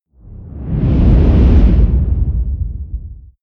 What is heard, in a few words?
whoosh mid tonal